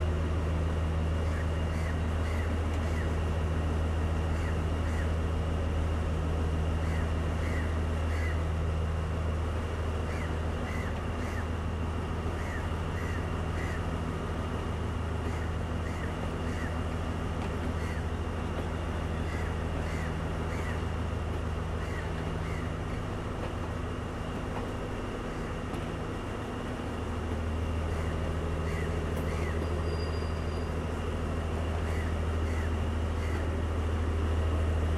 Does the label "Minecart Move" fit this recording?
Yes